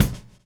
groove; AMG Gota Yashiki Groove Activator

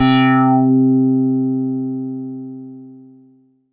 Rezzer c4, Korg Poly-800

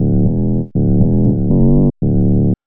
B-LOOP

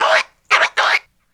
scratch, Scratch Groove

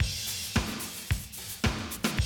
110, Classic Drum Loops

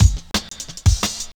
175, Breaks; Drum&Bass; EZ Rollers

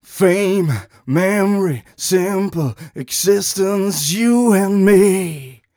130 mel g, Vocals

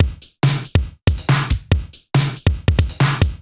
Break Beats, 140 BPM

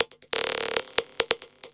percussion loops, Techno; PS-45 WAV Techno ID

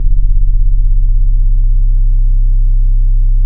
THE DEEP C2, Bass; Boom da Bass 3; E-MU Producer Series Vol. 6 – Analogia Project

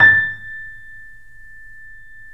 3D Pianos, Boesendorfer

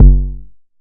Bass Kick, Jomox Airbase99, Drum Machines

